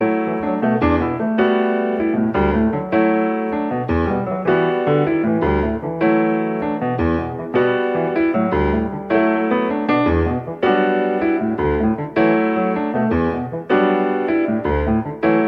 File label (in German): Improvisation